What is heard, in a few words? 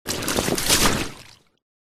Lilo inflatable mattress thrown into water